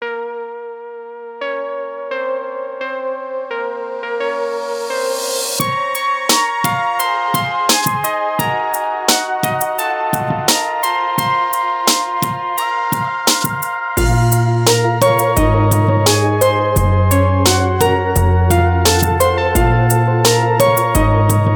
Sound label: chill piano; neuron